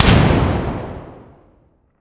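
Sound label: weapon sniper fire player atmo int; gmod; viper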